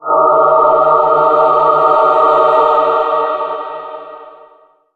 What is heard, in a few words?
Event Prestige Choir; Taps to Riches; Android